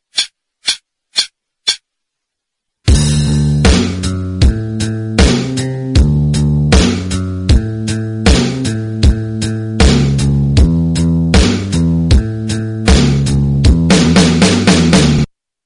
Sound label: chorus backing, guitar